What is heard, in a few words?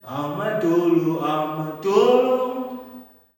"AMA-DOLO", MALE; Spectrasonics; Heart of Africa 2; S.MALEAMB